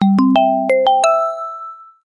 zil ve mesaj sesi

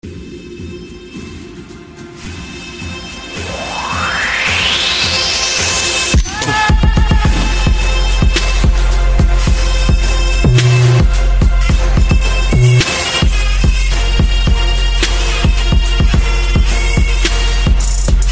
Mehter Marsi

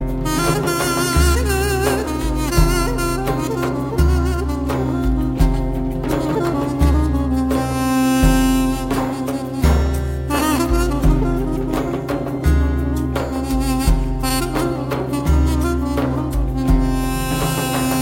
Kaval Sesi

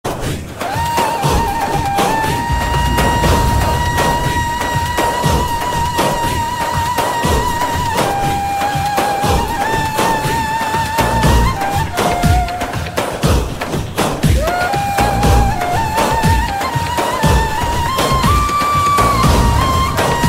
Zikirli ney

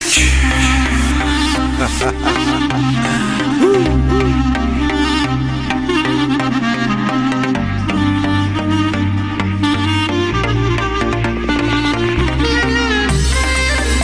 klarnet

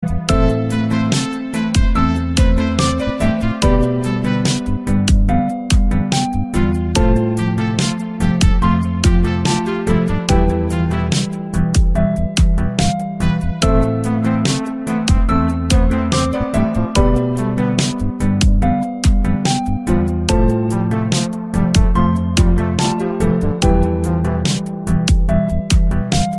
Duygusal Fon

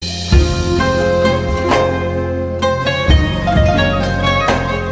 Sabah mesaj sesi

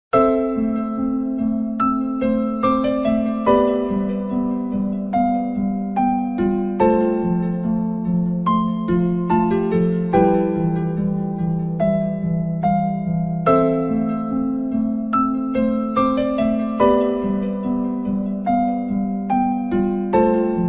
duygusal fon muzik

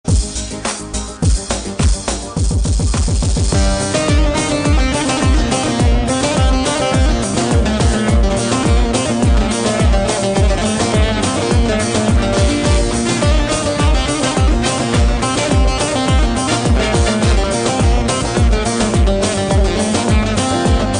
Kurtce Halay

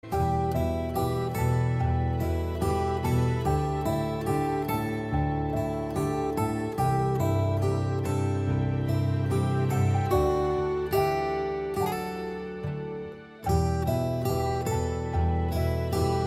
Gitar v Piyano

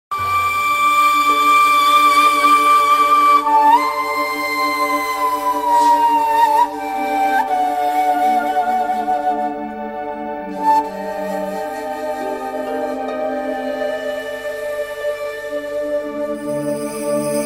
Ney zil sesi

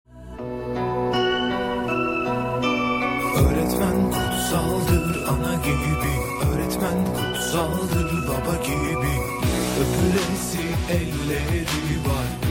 Ogretmen dizi muzigi

